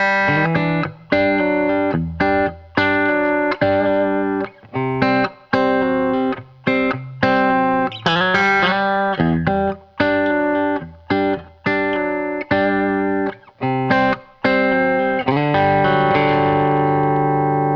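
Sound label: Country Guitar Outro; Digidesign; Pro Tools Essential Loops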